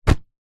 office plastic document folder wallet set down surface